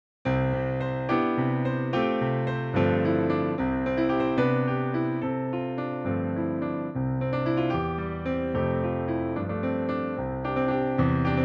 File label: everywhere christmas accompaniment